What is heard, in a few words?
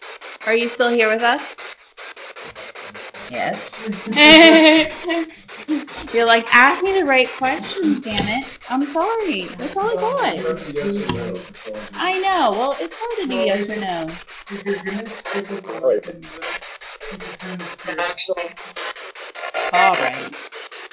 Childrens Reading Room Spirit Box; andrew bayne memorial library follow up investigation